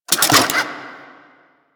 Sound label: Necramech Repair Drone Claw Calibrate; Enemies; Warframe